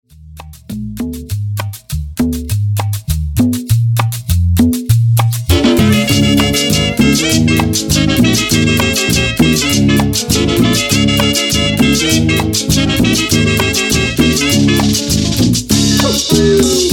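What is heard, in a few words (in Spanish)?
Extended Cumbia Colombiana OLD, Bpm 100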